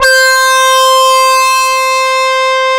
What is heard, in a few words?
MATRIX 12, OB Synths, Analog; E-MU Formula 4000 Series Vol. 3; Analog Odyssey